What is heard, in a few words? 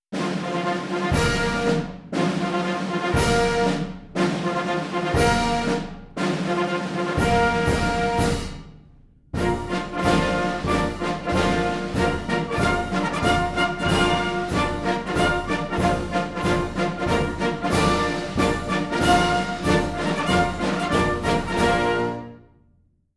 four ruffles and flourishes into generals march; Marine Corps Birthday Ball